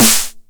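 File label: 909 SD Tape, snare drum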